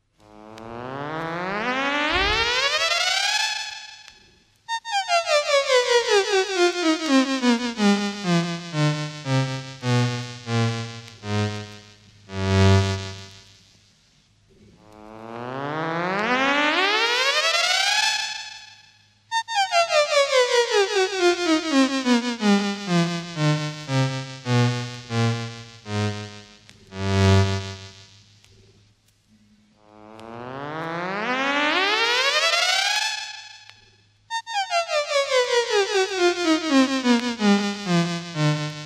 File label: ascend + descend seq vi, Electronic Sound Patterns; His Master's Voice